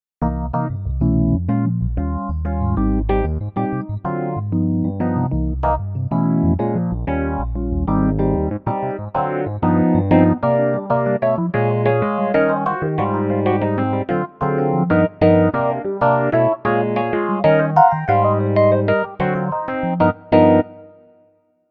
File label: Phaser, Classic Keys